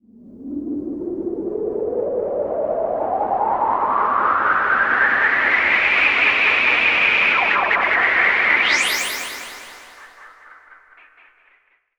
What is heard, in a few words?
Sweep; noise